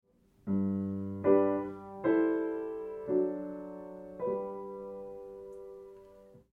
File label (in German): synk bass terz